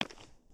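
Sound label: Foot Concrete Sneaker Walk; footsteps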